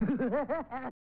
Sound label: ''Hoo Hoo Ha Ha Ha!''; Krusty's Super Funhouse